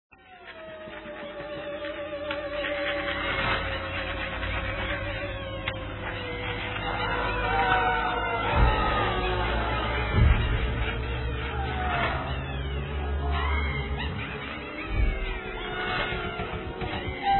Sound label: Scary Music